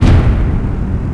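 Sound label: door large start; Theed; Star Wars Obi-Wan; XBOX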